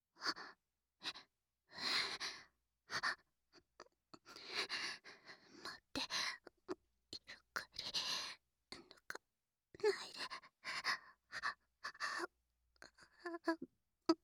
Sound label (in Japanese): 喘ぎ ゆっくり出し入れ 左